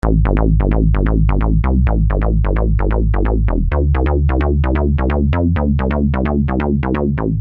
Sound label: Syn-Bass m Am, Techno Synth Loops, 130bpm; Sonic Foundry (Sony Creative Software); Signals I